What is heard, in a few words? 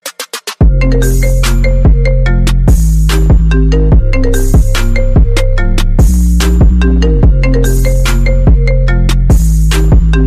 iphone ringtone